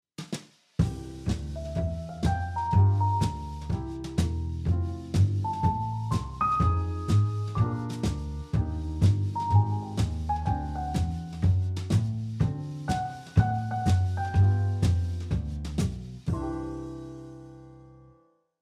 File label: jazz